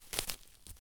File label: Right Grass Grassy Footstep